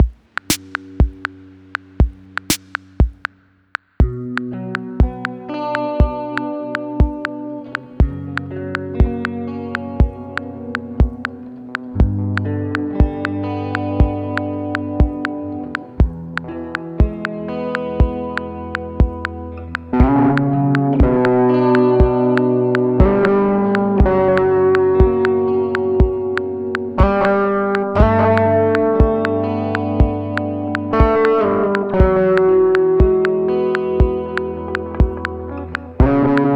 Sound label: guitar test; weeklybeats-2020